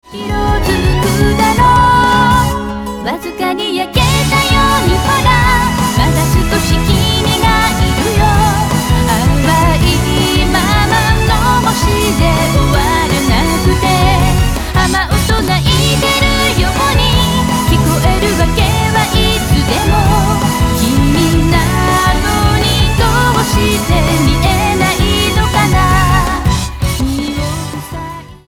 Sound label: music